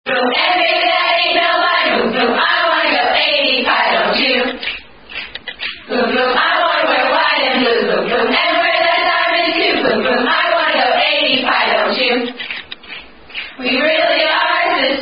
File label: Sorority Song; Alpha Delta Pi Sorority Song Related To Pi Phi Song; Theta Epsilon